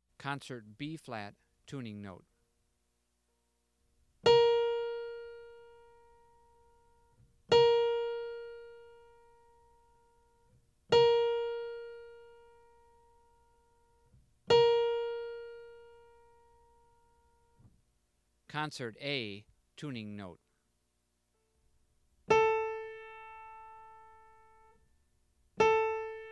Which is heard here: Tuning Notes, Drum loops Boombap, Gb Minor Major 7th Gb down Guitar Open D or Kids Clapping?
Tuning Notes